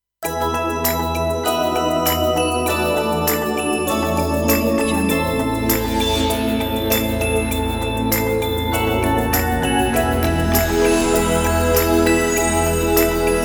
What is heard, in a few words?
ident; simonleng